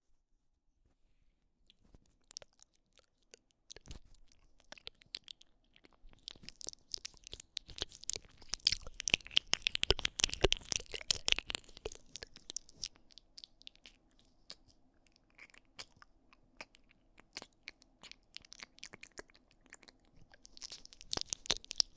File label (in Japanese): 耳舐め, ASMR